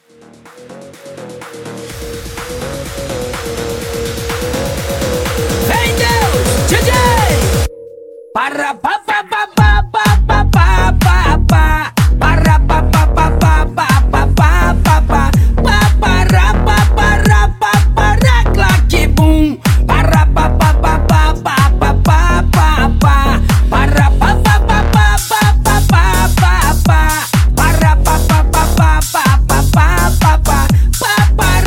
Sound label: House Quick Mashup 125 CLEAN